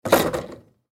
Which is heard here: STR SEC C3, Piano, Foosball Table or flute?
Foosball Table